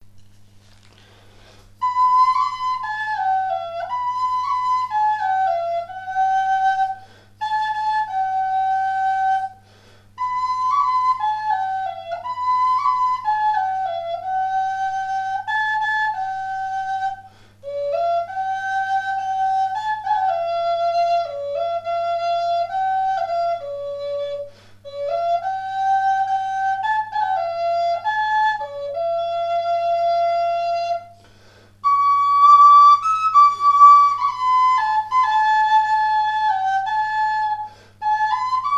Son flute amerindienne re roseau

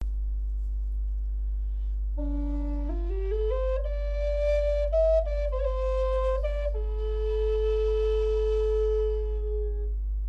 Son flute amerindienne re grave chataignier

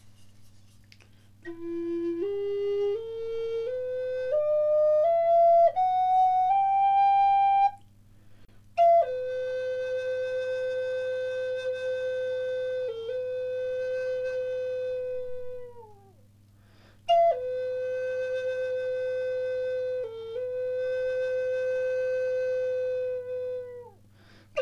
Fa en érable rouge

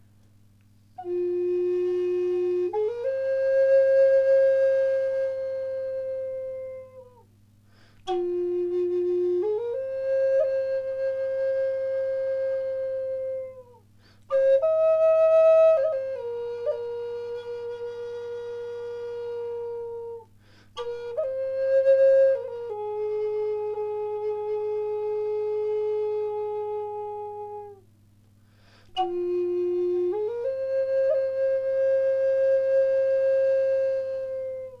Fa en érable rouge

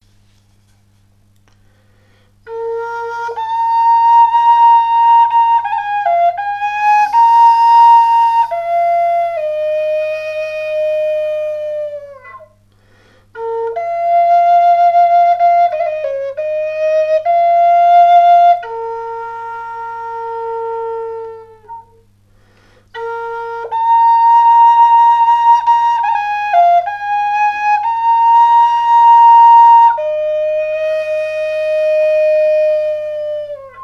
Son flute amerindienne sib bambou

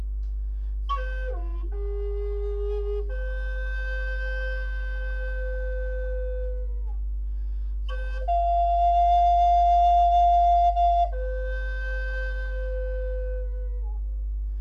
Son flute amerindienne fa chataignier

